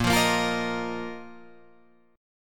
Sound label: Bb Suspended 4th Bb down Guitar Drop D